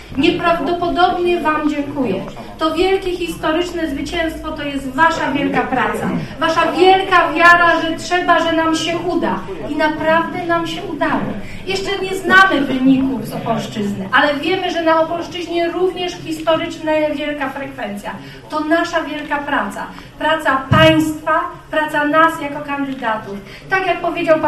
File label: viola